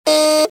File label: wrong